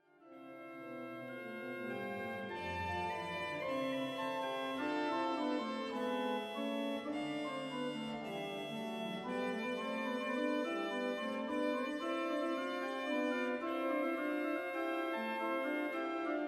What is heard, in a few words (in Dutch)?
Hinsz-orgel klankvoorbeeld; Appingedam